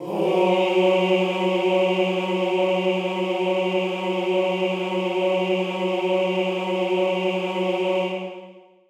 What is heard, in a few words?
chorus male f#3; Sonatina Symphonic Orchestra